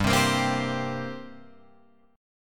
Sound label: G Minor Double Flat 5th, G, Guitar, Open F; strummed; JGuitar